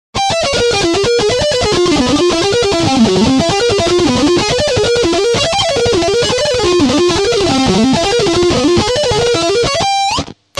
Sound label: stretching, guitar